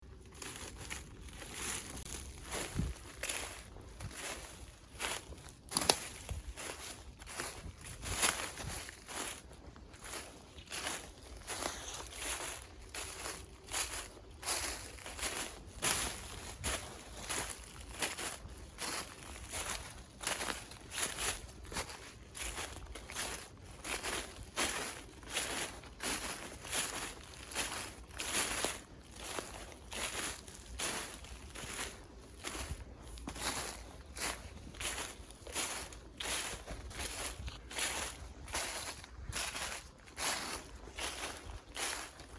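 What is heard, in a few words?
footsteps in winter forest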